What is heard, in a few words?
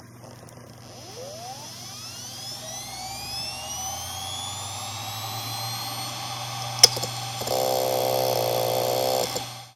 hdd start; digistuff nbextras